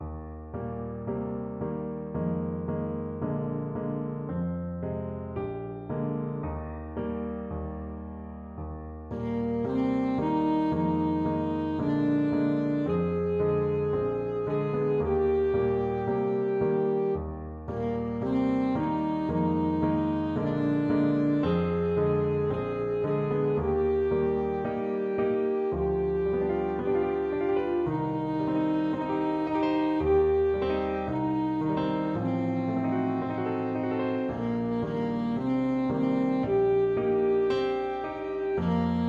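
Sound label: alto saxophone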